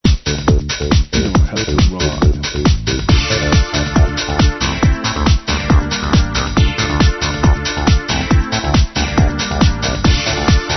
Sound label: trance; bass bumpers